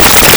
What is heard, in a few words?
NOISE